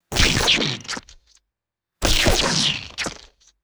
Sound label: wet goopy sci fi spit gun shots; liquid